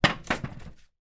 household chair wood patio folded lean drop bang; zapsplat

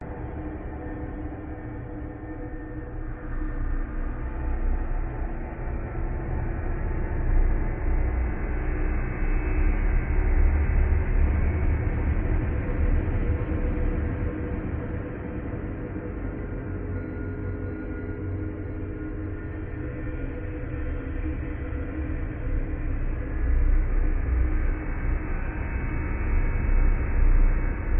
Soundscape; PMSFX

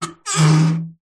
foley metal tap rub squeak resonate; zapsplat

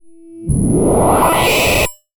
StunGun; martyn stonehouse

